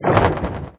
net; wesnoth

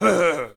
ogre die; wesnoth

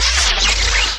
magic dark; wesnoth

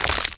skeleton hit; wesnoth

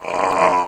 zombie hit; wesnoth

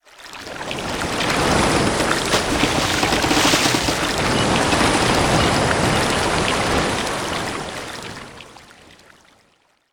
ship, ambient; wesnoth

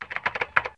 chat; wesnoth

